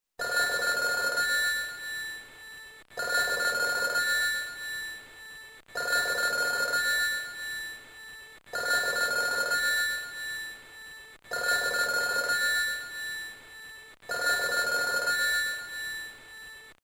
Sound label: Phone Ring